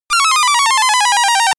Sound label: synthetic energy drop